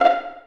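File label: Vio pizzicato F4; Digital Sound Factory Virtuoso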